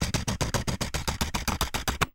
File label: Camera Sequence